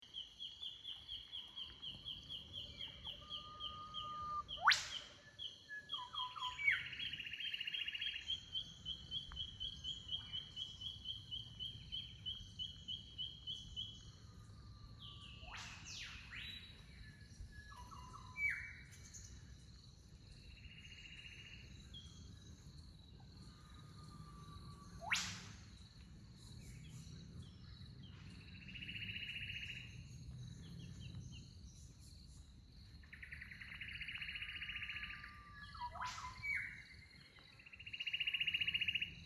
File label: Aussie Birds